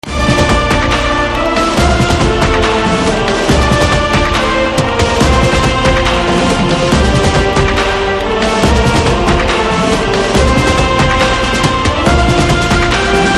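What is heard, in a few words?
background music Ringtone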